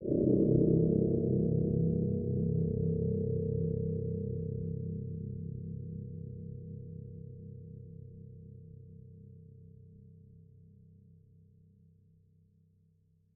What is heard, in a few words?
Eerie Tense Hit